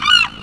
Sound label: seagull pain; ambient; creatures